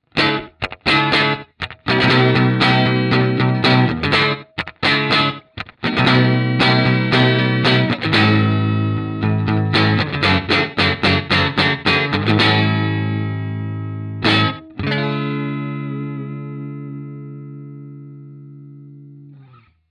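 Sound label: small, reverb; tech21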